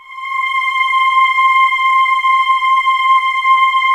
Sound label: VOICING C5, Choir Acoustic & Synth; USB Soundscan vol.28 - Choir Acoustic & Synth; AKAI